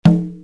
LINN PEC

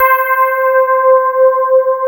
SPARKLES; Wizoo - Powered DX

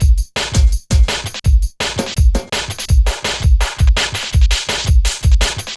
Hard Stepz, Drum Loops 166.5; Zero-G Jungle Warfare 1, 2 & 3

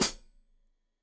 Hat Foot, Hi-Hat, Cymbals Stereo

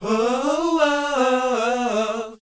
SHOO B E, R&B Dance, vocal; Spectrasonics Vocal Planet

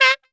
SAX, Drum & Bass, 100 BPM; Ueberschall; Drum & Bass Resonance

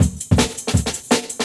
Jungle Breaks

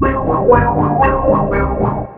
ORCHFX, orchestra, Asian Traditions

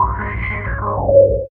MACHINE; East West - Phat & Phunky CD2; 90's Sample CDs

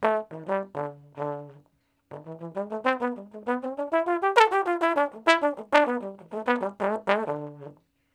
Trombone Straight (G); Crimson Blue and Fabulous Horncraft 4 RnB; Horncraft for R&B; Solos

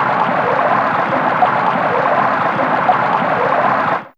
Gurgle, Fairlight CMI IIe

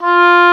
Oboe mf F3; Digital Sound Factory Virtuoso

